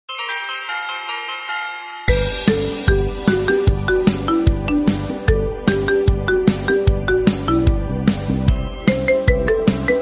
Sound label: marimba remix